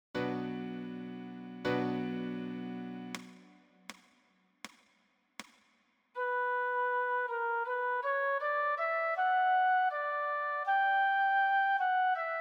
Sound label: Melodic Dictation; y12 sem2 exams